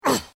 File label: Pain Umph, Male Painful Grunt, Hurt